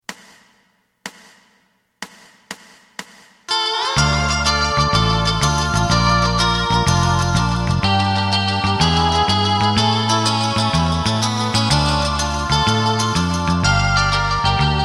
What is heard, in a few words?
drum; エレキバンド用カラオケ